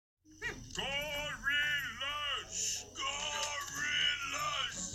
Tarzan gorilla

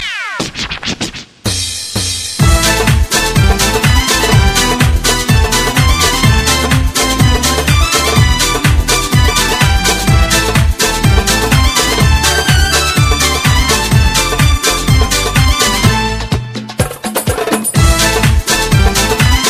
Uyghur Pop Music

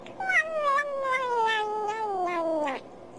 Sms

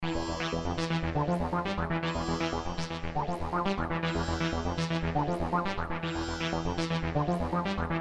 Hindustan Techno

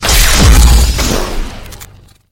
Kylo ren open saber

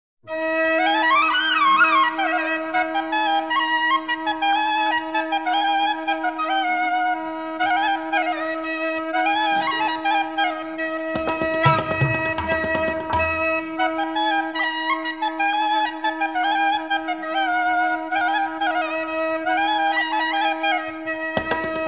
Bansuri

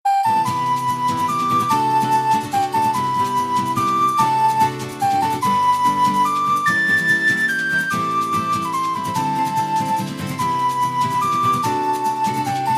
Ocarina Medley

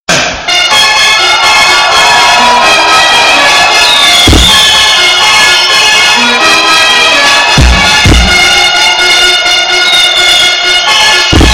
Funk brazilian

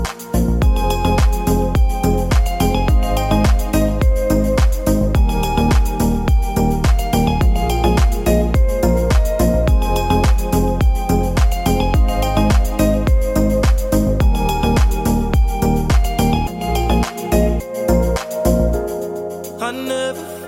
chillout alarm tone

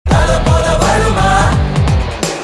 Sms

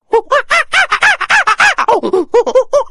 Chimpanze

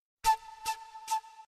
Flute Msg

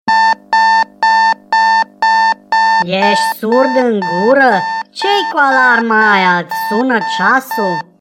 Roboti Alarma

